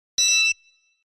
computer expansion

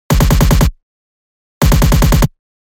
Assault rifle

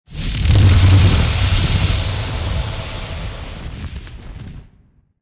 Fire element spell

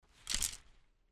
Reading bow

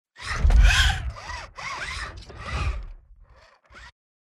ROBOT SMALL

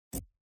UI moving option to one anoter

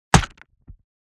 BOW hits

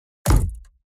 unleash arrow

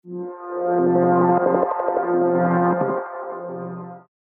Scanning

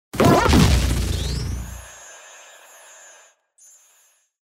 Thunder element

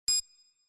computer beep

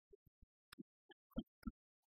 Armored SAMURAI runs

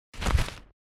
Rolling

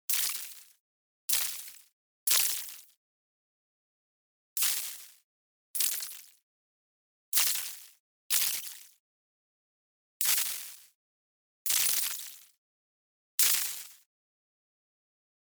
SHORT CIRCUIT

